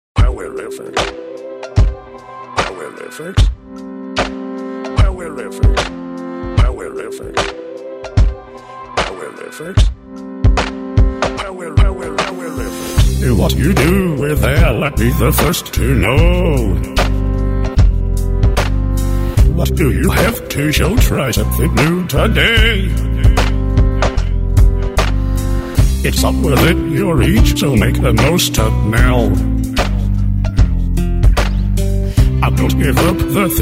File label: Hymn; PowerFX